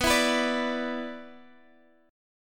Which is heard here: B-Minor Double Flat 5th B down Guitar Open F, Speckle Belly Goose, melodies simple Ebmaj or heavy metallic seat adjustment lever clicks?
B-Minor Double Flat 5th B down Guitar Open F